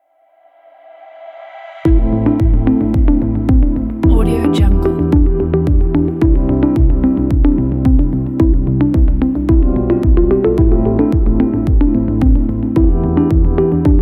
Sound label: beautiful minimal ambient